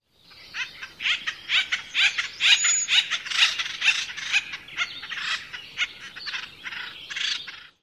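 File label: Acorn Woodpecker calls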